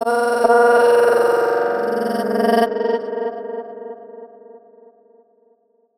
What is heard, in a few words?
Voice A# 140 BPM, psytrance; Voices; Hits; Ghosthack; Psytrance Visions Volume 2